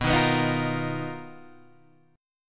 B Major 7th Flat 5th B down Guitar Standard; BM7b5; strummed; JGuitar

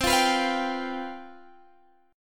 C Major 7th Suspended 4th, down, Guitar, Drop D

